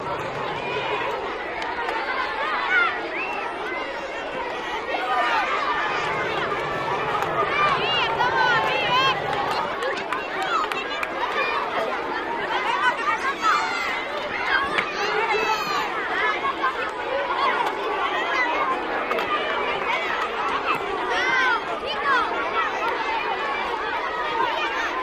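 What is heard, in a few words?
Children Group Idle Cheer, kids play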